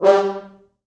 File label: Fr Horns stac